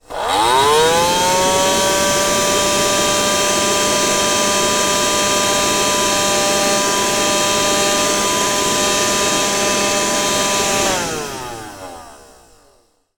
household dustbuster handheld vacuum cleaner on operate off low battery